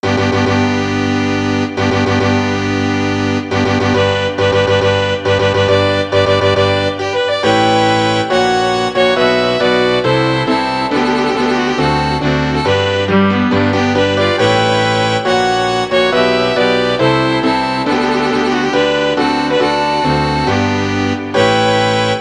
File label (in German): streichquintett u klavier; hochzeitsmarsch mendelssohn